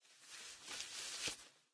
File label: cloth long; smite; scylla